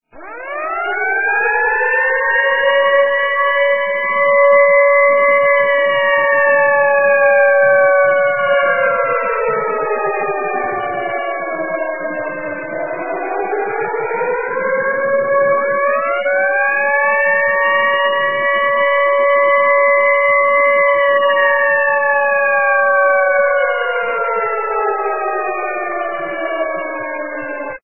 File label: air raid siren